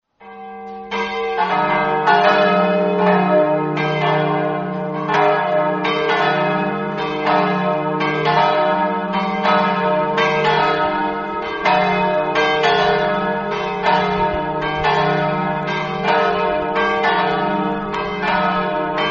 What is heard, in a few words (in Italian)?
manzano, campane